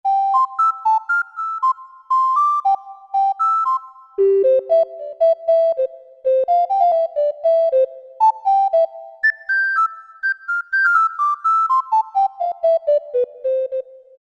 Ocarina, Roland SC-50 Sound Canvas; Factory sounds

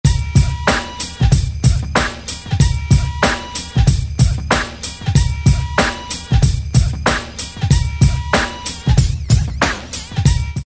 Gangsta 94; Roland SR-JV80-06

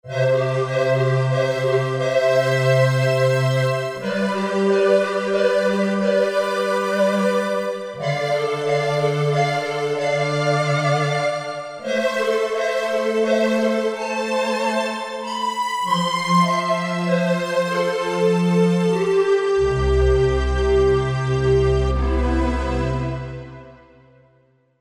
2 voices; Korg MSC-12S Synth 3

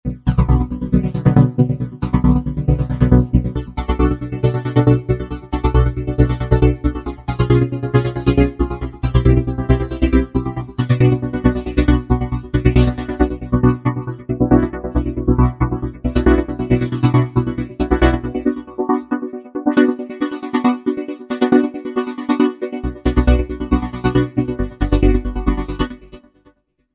Roland V-Synth; E-Motions; Factory Patches

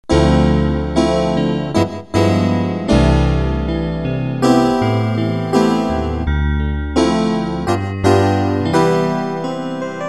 Yamaha TG55; Factory Presets; Elektrodes

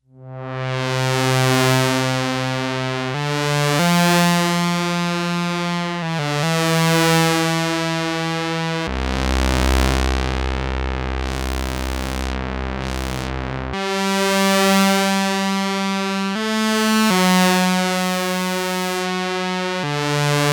Pad, Arturia MicroBrute; Blade Runner; Factory patch sheets

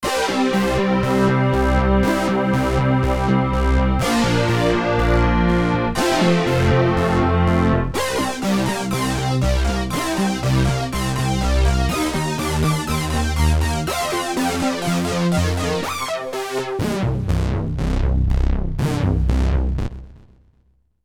Sync, Korg Radias-R; Factory Presets; Sync City

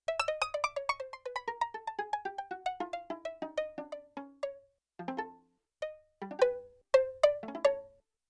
High Pizzicato; Yamaha TX16W

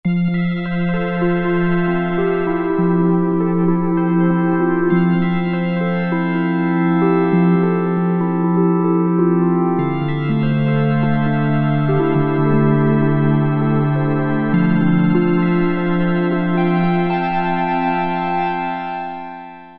Pad, Alesis Micron; Factory Programs; Ecology

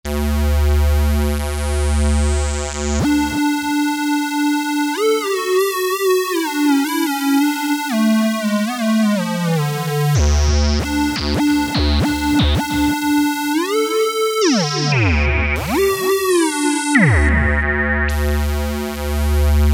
Lead Porta, Alesis Micron; Factory Programs; Lucky Porta m2

